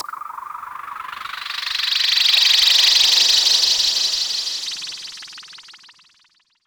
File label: oscillators 23 saw waves, highs